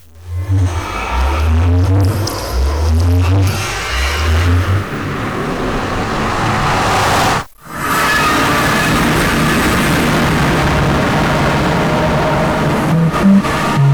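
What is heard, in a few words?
computer land theme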